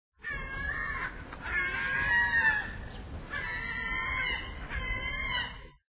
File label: seaguls; tootoona